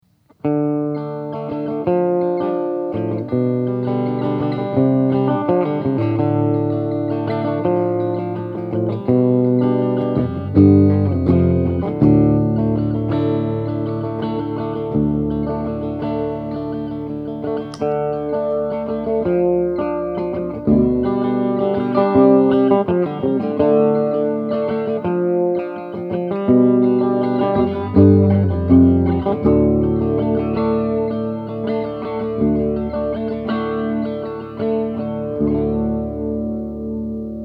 Very Subtle (Rate @ Noon, Depth @ 9), chorus, guitar; CE-2; finger-picked chord progression